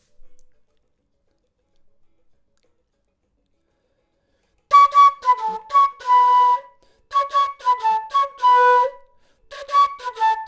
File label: flute; logic